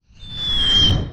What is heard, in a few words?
artillery incoming